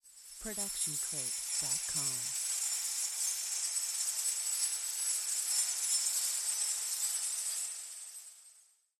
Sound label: scifi swarm; soundscrate